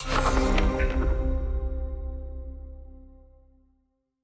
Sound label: win small; netent